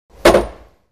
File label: Bowling Ball Hits